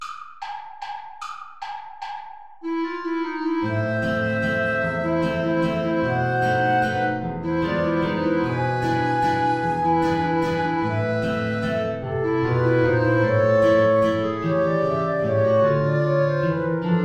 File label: play along without guitar; traditional